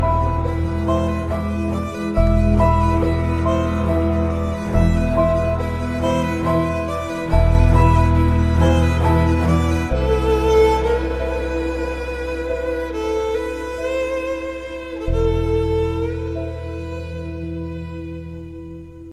Violin

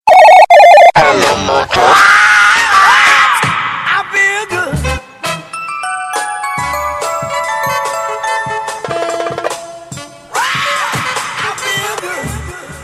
Feel Good; Nokia

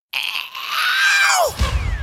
Horror Sms

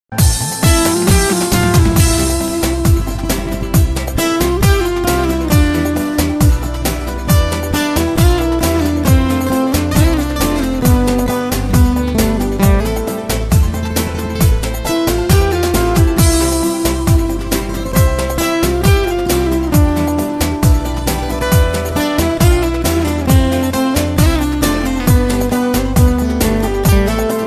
Romanian Guitar

